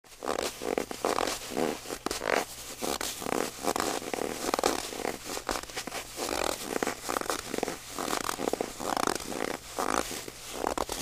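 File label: snow crunching sound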